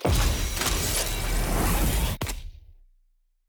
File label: reroll outro; loot